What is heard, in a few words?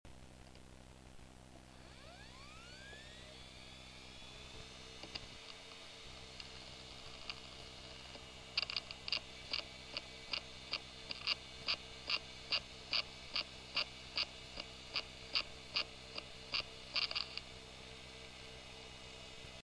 fujitsu 20gb bad media scratches